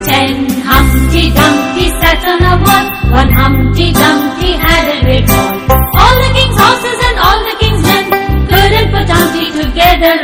MuSICA DE FONDO

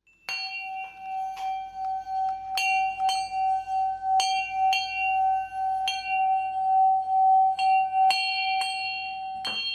SONIDO DE CAMPANA

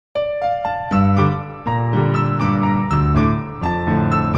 Piano Cover

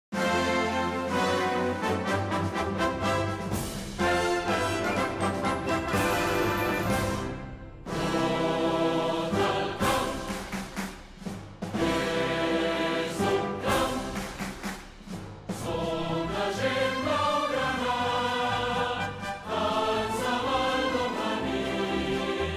Nuevo himno; Barça

